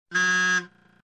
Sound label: wrong